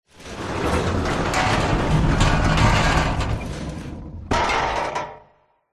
emergency prison cell door metal slide close rattle reverb